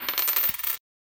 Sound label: coins; sorcery